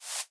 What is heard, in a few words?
step; Incantation